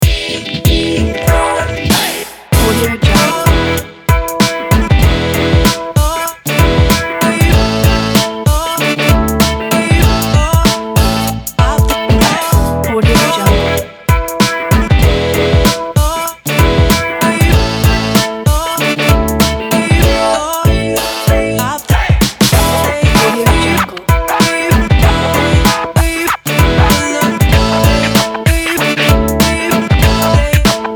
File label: that hiphop